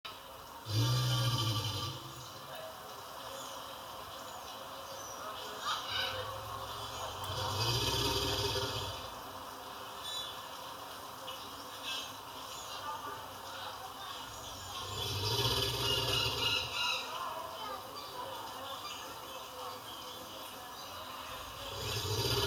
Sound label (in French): alligator